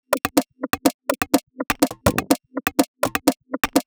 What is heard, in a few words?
Top 124bpm, House Drumloops; 90 s Sample CDs; Zero-G House Fabrik Drumloops; ZG Drumloops